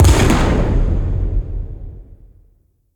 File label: Siege Distant Explosion; Level Events; Midrath; Warsong Prologue